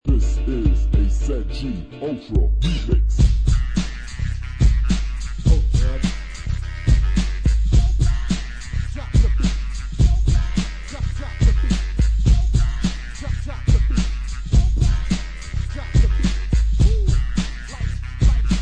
acapella vocal

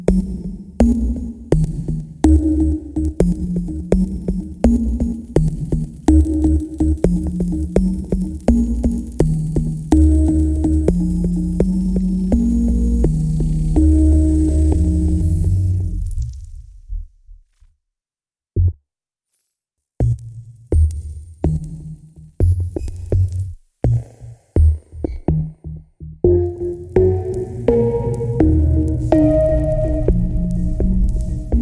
Petal Pluck

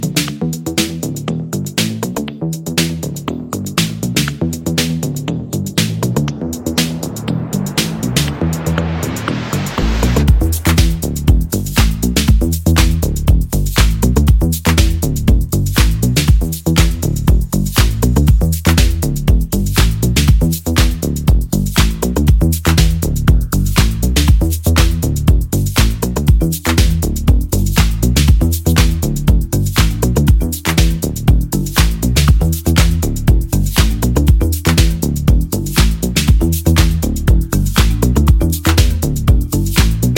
Marimba Message dub mix

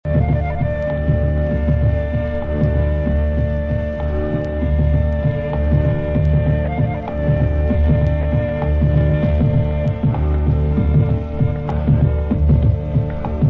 instrumental

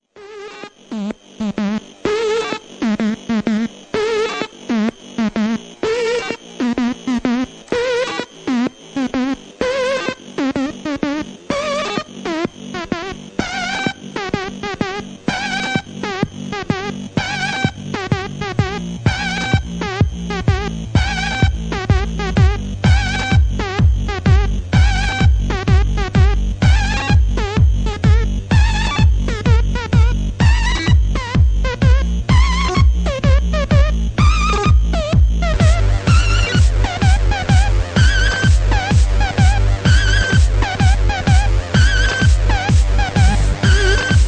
Instrumental

